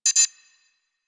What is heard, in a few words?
Bridge Comp Beep; Warframe; Hub; Liset Bridge